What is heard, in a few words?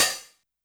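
Hi-Hat Open Hard H; YAMAHA RM1X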